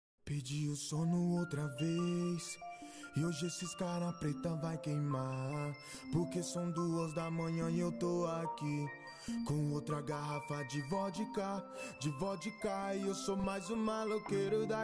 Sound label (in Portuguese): hip hop graves bass